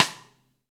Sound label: V-Pop CStick, Roland BK-5 Rimshots